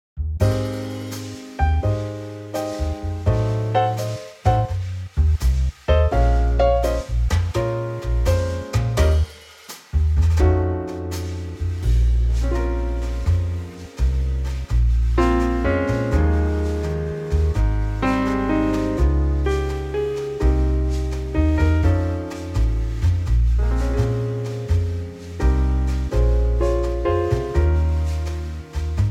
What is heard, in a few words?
Ab Trio